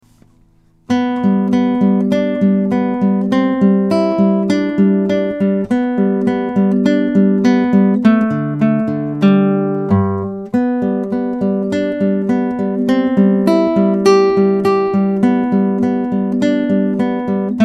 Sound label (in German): Fingerspiel